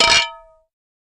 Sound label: Champagne Toast, glass clinks